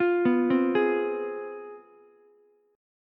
C Major 7th Suspended 4th Sharp 5th, down, Ukulele; CM7sus4#5 arpeggiated; JGuitar